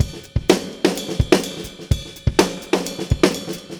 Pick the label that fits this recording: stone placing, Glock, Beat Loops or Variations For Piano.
Beat Loops